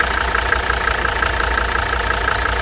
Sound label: diesel